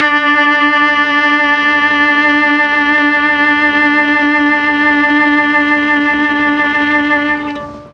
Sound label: D3 VIOLA, Mellotron; Mike Pinder - Mellotron Archive CD